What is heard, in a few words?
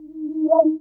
Rhodes FX; EZRollers